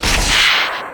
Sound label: projectile launch; Templar Assassin